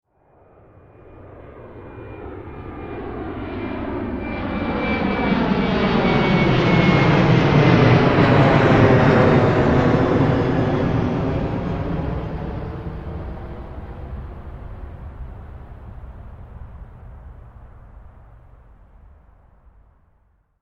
transportation aircraft commercial jet pass low external, Aircraft (Large Jet) Fly Low Overhead; Aircraft - Large Jet Airliner Fly Overhead Very Low; Airplanes; Vehicles; Aircraft (Large Jet) Fly Low Overhead 10; McKinney Sound